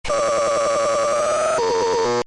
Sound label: big pain; swordsmachine; ultrakill